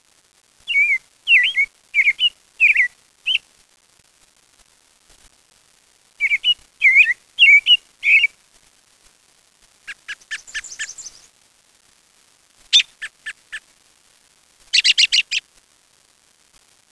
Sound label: american robin, chants